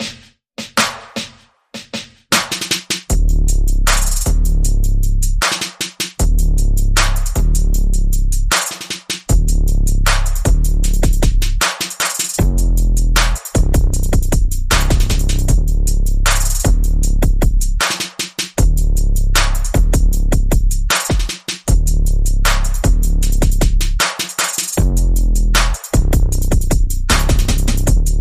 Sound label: Bass Perc and FX Only